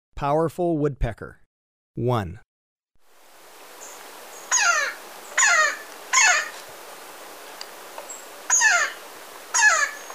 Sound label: Powerful Woodpecker, Birds of Ecuador; S. America